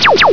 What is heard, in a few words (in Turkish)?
lazer; ses efekt